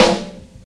wet steel snare drum b key, snare drum sample tuned to the B note